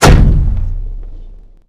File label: avre shot churchill; WAR